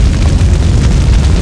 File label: fire loop; Falcon4